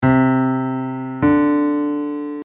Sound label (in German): Intervalle, eartraining for guitarists